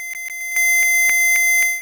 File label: Hypnosis